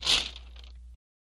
Page Turn Standard; paper mvmt

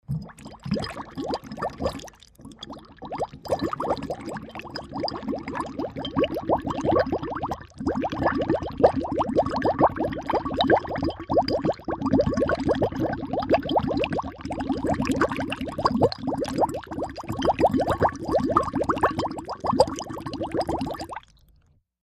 Bubbling Liquid A Jar

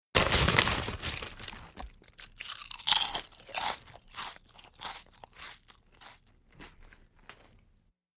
Potato Chips Bag Reac

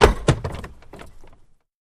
Carriage Footplates

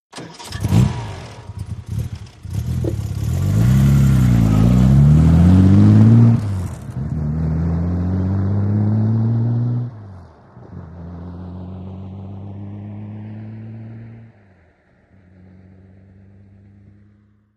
VEHICLES ASTON MARTIN: EXT: Start, depart, brisk.

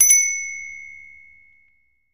Bell Chime Store Doo, doorbells

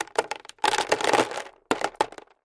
Landscape Rock Plast, rocks & dirt

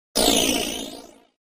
Scanner HF Elect Scan

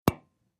Camera Strobe Light

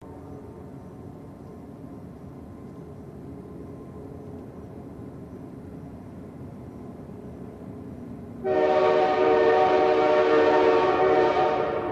Train Fst By Horn On Ap, bys

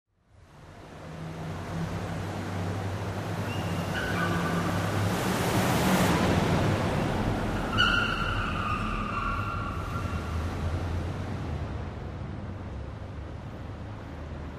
Wagoneer Var Driving, jeep wagoneer

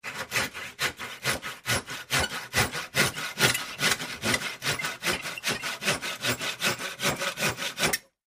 handsaw sawing

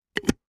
plastic lid close, cups

